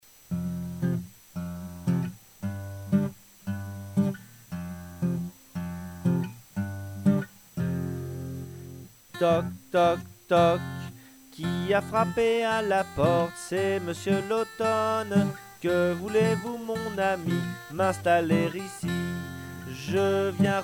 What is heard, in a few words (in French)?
chant